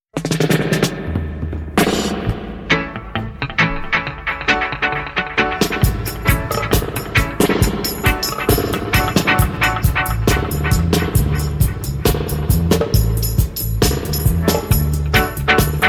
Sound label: Murderous Horn Dub